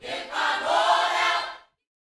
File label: YOUTH CH PHRASE, Vocal Planet Gospel